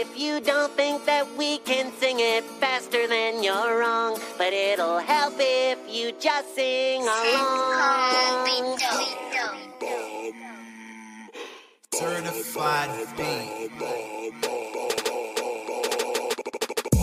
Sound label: remix